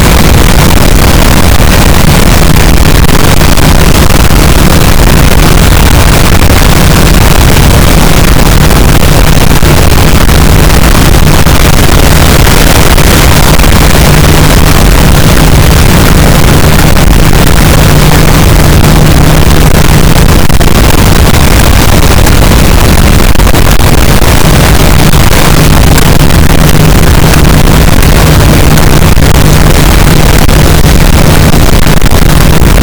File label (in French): cachalot positif, boat; Physeter Macrocephalus